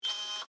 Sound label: Security Camera Servo, Auto, Focus, Short; Technology; BlastWave